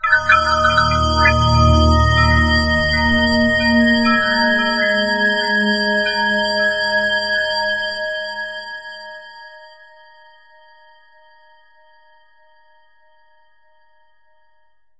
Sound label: BGM Crafting